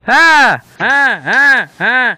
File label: "ha ah ah ha", Voice; ooh ahh